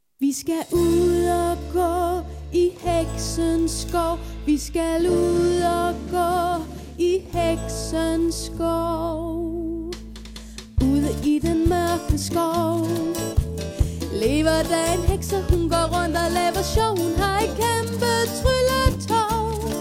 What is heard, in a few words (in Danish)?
heksens sang